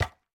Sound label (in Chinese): 树脂砖 踩踏声